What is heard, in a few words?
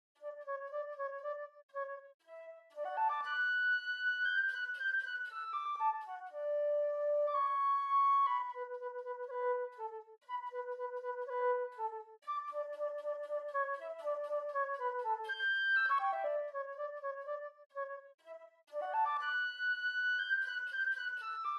Flute; Nutcracker Suite Dance of the Reed Pipes